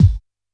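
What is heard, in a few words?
C2 Analog Bass Drum Dance, Yamaha PSR-E323 Dance Kit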